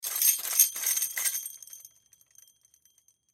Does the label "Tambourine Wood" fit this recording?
Yes